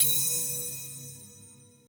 count Up End; netent

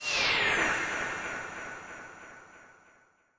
mega Win Text Change; netent

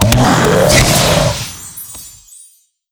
Grunt Die; netent

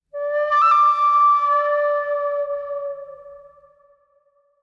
ambience flute; netent

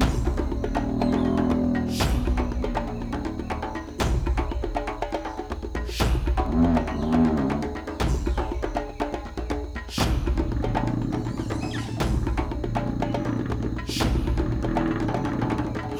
Splash loop

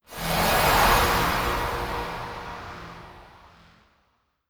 spin Start; netent

